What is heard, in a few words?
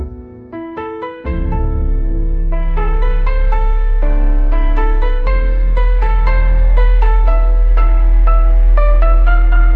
piano solo, bella ciao; musiclm; melody conditioning